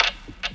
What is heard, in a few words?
Perc; M8; Electronisounds